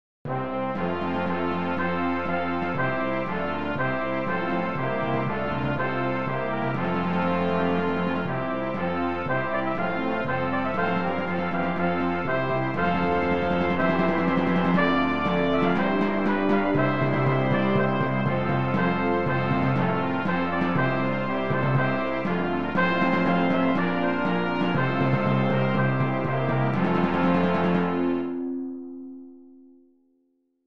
Brass

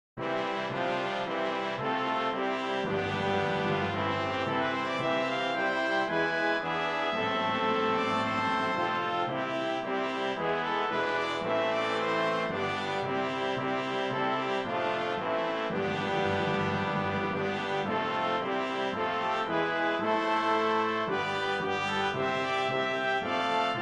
Hymn BRASS; Jerusalem the Golden; Ewing

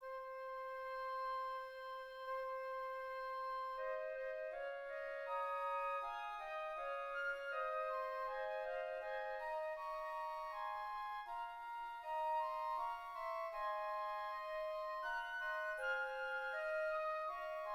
2 Flutes

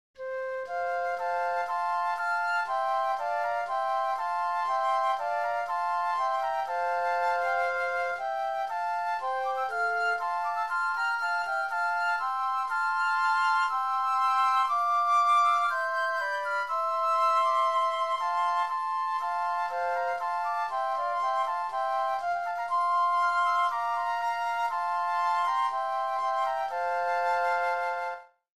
Flutes

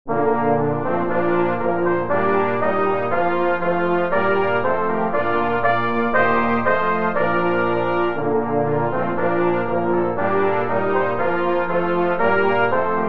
Hymn

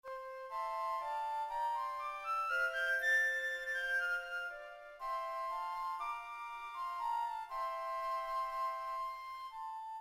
Flute

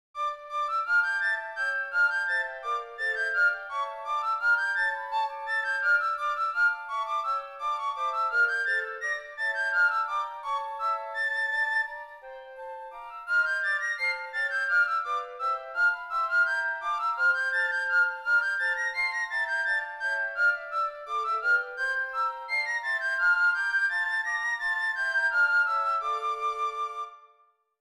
Flutes PICC

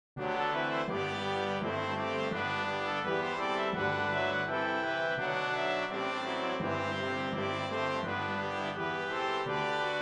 Hymn BRASS

